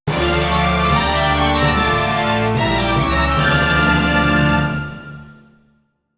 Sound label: All Clear